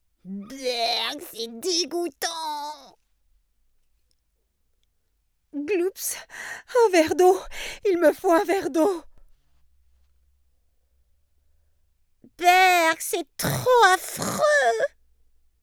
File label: bees; marmite